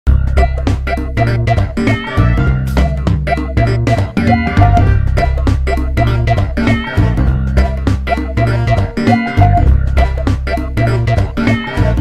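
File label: Forest theme; SMM2; SMB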